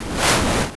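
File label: flame attack, 8 bit shoot sounds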